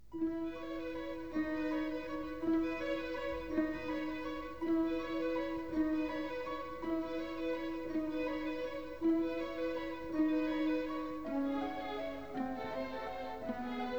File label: TENOR track only